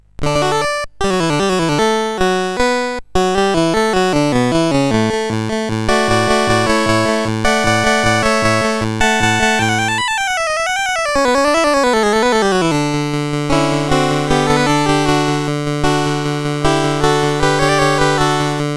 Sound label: Allegro, sid CSG8580R5